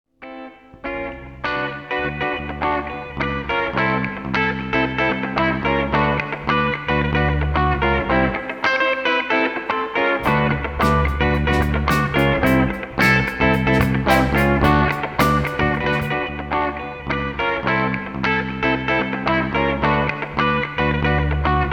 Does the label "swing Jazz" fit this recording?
Yes